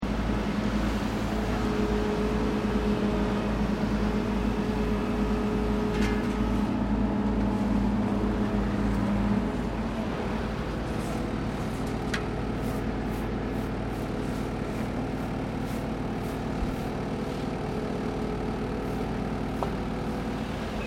Construction Vehicle Bitumen Layer And Roadcrew Operation Loop, Road Construction in Sannohe